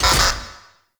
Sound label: Railjack Weapon Ordinance Empty; Warframe; RailJack Weapons; Modular Weapons